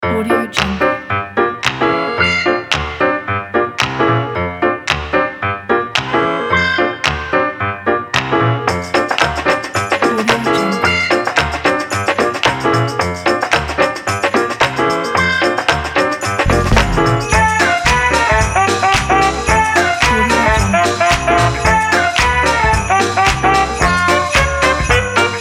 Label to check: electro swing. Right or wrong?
Right